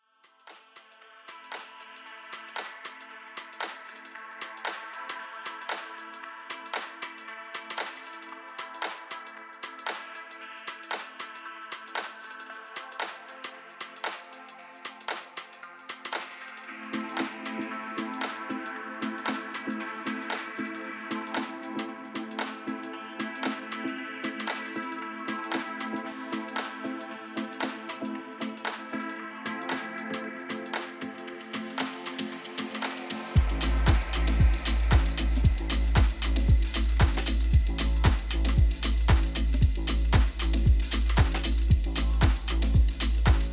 Deep House Lounge Chill Out, Lofi

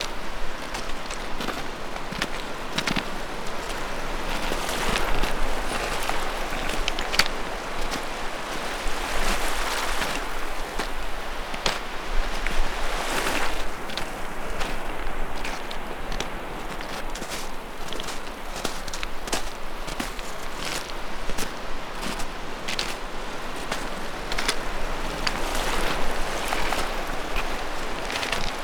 Ocean Sounds - Gentle Waves; ASMR

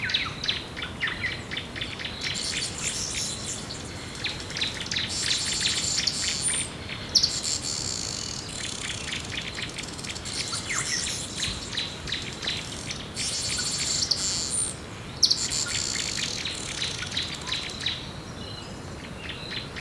Rainforest Woodlands Birds Wind Flies

